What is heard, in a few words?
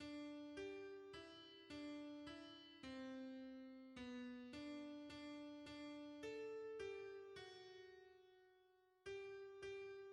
alto; Jesus ton nom rappelle; hymns